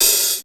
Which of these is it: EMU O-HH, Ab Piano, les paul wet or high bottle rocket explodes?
EMU O-HH